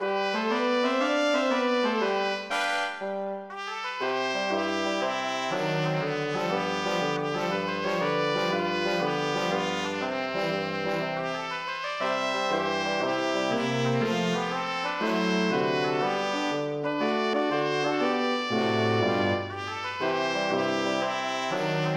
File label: 76 tubas